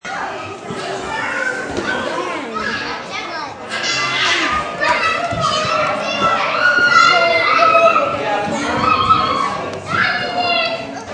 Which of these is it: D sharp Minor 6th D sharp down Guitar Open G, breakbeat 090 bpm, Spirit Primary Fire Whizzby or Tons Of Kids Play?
Tons Of Kids Play